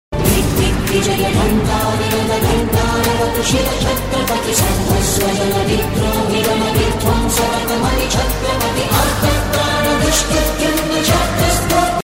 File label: huawei mate 10 ringtone